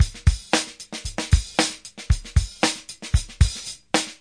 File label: 114 simple honest funk, drum loops